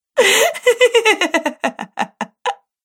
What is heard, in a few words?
Woman Laughter